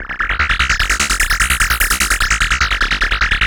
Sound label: Analog Rhythm